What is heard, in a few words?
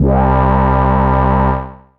Dirty Pulse Bass; Analog Bass; Ableton; Factory Packs; Retro Synths; Analog Bass Vol 2